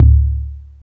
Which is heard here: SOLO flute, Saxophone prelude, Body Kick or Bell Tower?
Body Kick